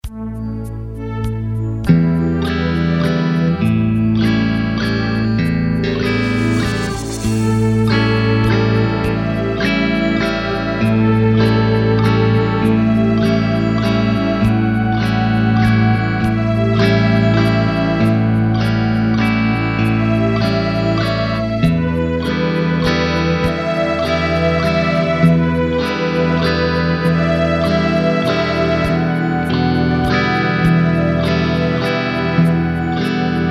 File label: Violin Guitar